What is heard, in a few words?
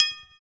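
pipe drum; ILIO - Ethno Techno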